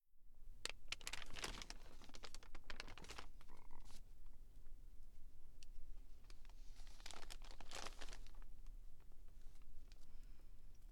Read Paper with Paper and vocal; the 39 steps; Foley; FX Hannay

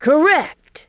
Correct; Lego My Style Kindergarden macOS Windows 2000

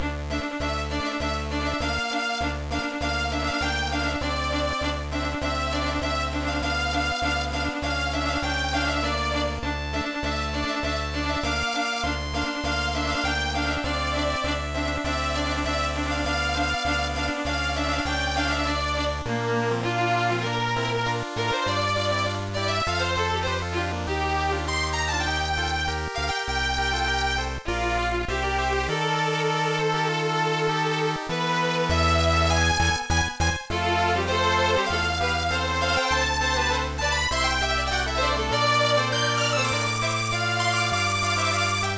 Conversation; Tactics Ogre The Knight of Lodis

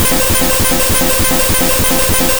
Solar Beam Charge; Pokemon; Gen 2; attack moves; GSC

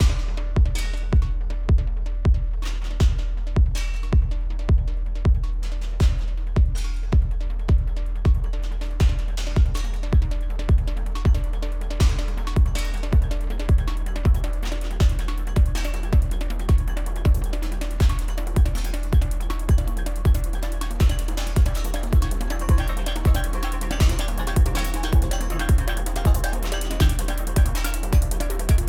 Music Fight; Sifu; H4 Level Area=Cave Lvl3

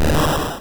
Not Very Effective

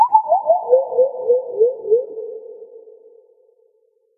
Trip Birds; And Yet It Moves

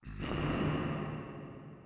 groan

